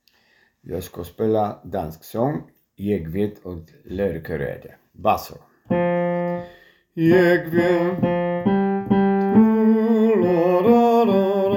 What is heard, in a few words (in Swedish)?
bas